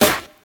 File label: 1990s sparkle snare sample d sharp key, acoustic snare sound tuned to the D# note; snare drum samples